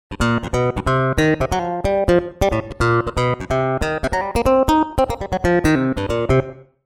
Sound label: Clavinet; TURBO VL